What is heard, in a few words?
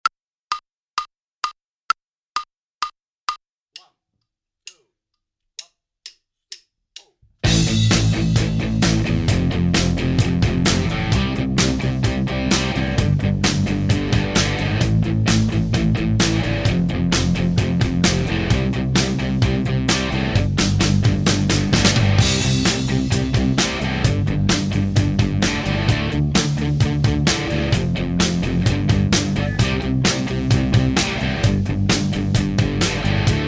Minus Keyboard; Inst Ensemble